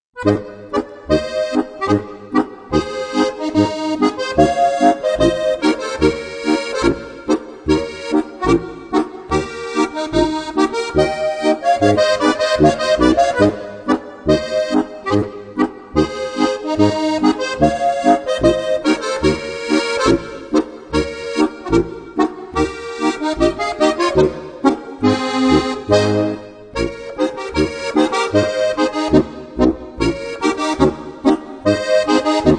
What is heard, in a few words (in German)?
boarischer